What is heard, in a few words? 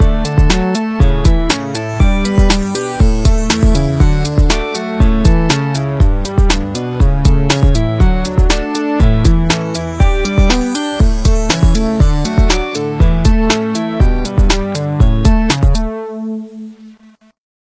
Arp Magic 120